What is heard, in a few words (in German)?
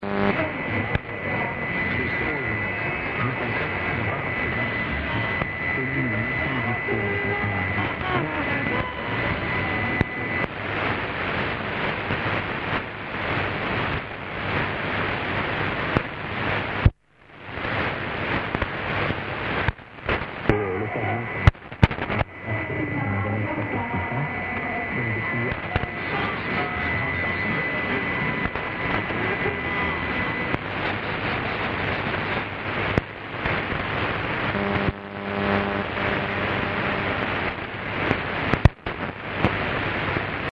Uebersteuerung auf 5415khz